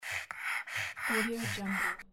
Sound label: dog breath loop